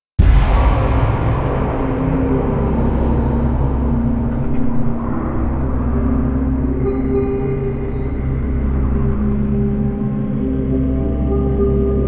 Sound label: creepy piano